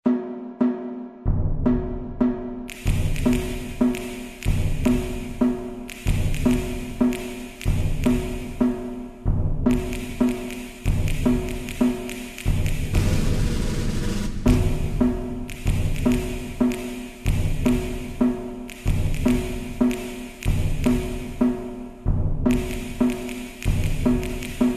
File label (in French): chant des tambours